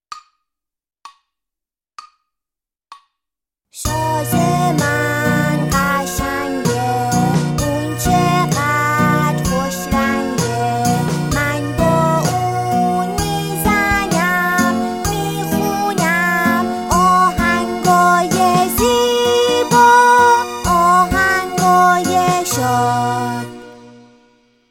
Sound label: Saze vocal; ویولن; کتاب آموزش گام به گام ویولن